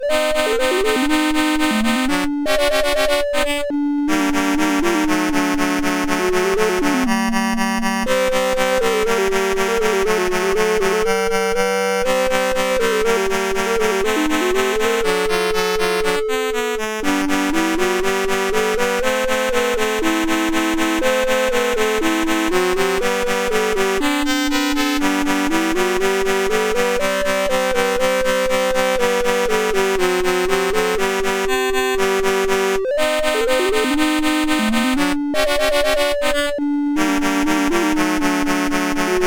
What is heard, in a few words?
Tango, CSG8580R5